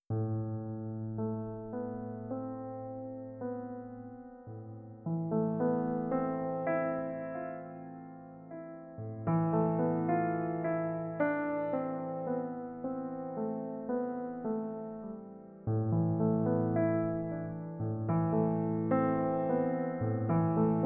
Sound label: instrumental